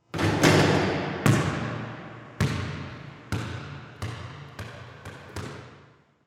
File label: Sports Basketball Shoot